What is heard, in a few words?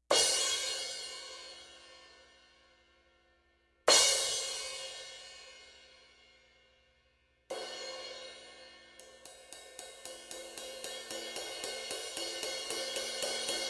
Sabian Proto AA